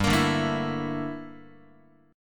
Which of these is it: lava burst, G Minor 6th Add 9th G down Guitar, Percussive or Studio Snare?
G Minor 6th Add 9th G down Guitar